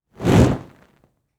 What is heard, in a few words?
Fire Torch Movement; Fire and Explosions